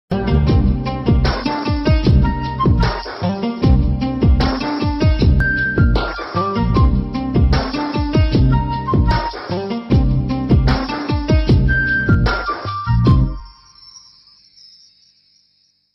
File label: romantic bgm; madhumasam